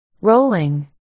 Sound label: rolling